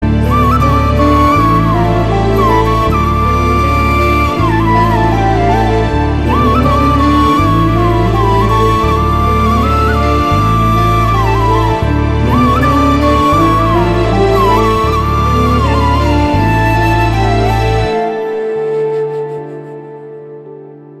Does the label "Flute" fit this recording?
Yes